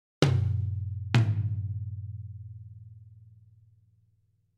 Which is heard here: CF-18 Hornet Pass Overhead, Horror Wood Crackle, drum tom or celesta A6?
drum tom